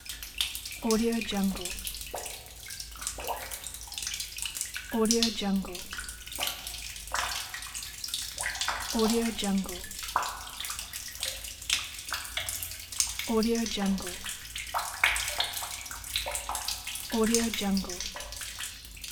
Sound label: cave drops; moonaudioproduction